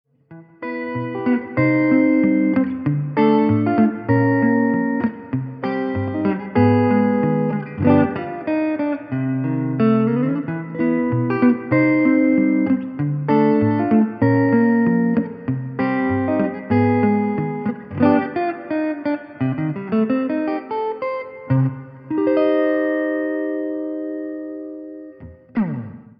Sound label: plate; ventris